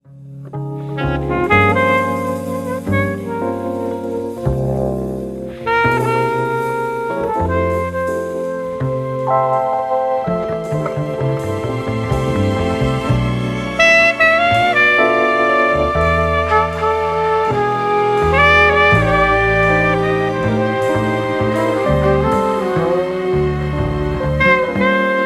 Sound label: jazz